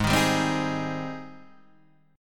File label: Ab Major 7th Ab down Guitar Half Step Down; AbM7